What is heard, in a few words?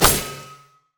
Shen ki attack; LoL; TPAShen